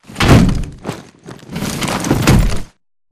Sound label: bt pilot embark casual above left; Titanfall 2